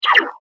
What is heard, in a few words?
Laser Blast Shot; Laser Gun Sound Effects; Andrew Fleming